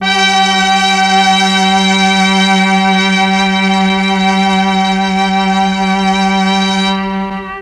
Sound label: MEL STR, Legendary M400; ILIO; Propeller Island Legendary M400